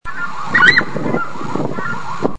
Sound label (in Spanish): voz chajá